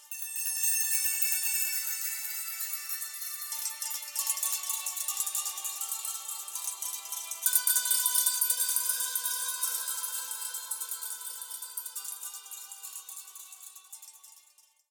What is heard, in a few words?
Forest Dulcimer; torchlight iii; ps4